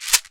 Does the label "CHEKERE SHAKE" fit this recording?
Yes